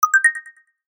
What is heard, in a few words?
notification